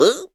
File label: vault vocal; Scrat